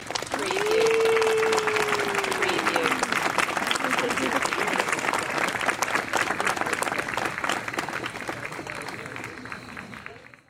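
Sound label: Small Crowd Clapping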